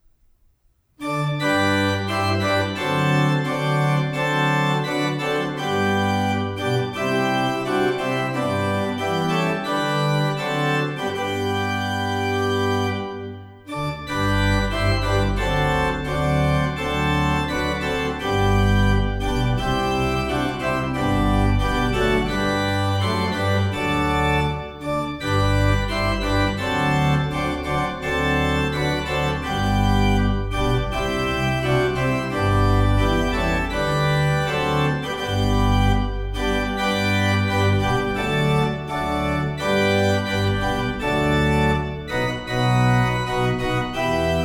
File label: Organ Only; The Lord is my Light (Opening Hymn Organ Only); Pioneer Memorial Church; Kenneth Logan